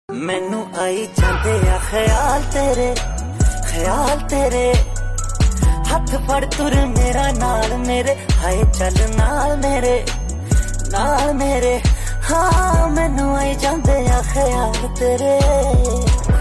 Ringtone

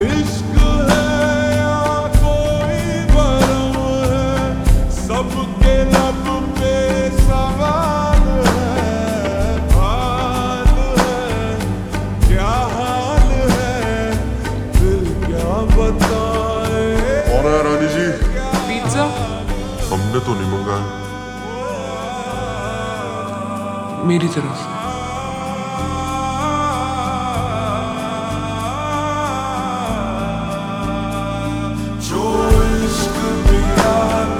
Ringtone Slowed Reverb

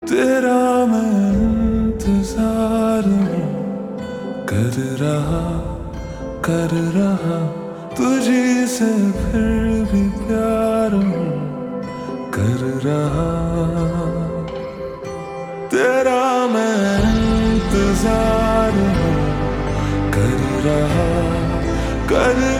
Ringtone Slowed Reverb

